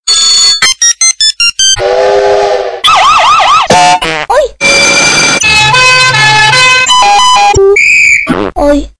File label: Best Message